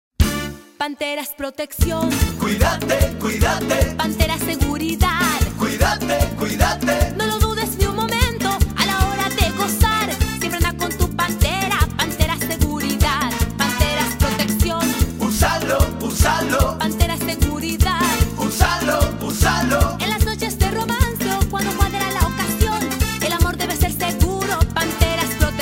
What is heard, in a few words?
Merengue